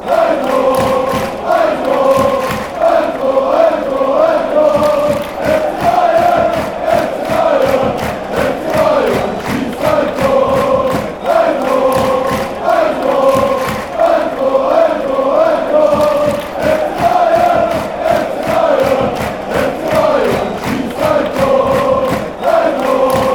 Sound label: a goal, fan chants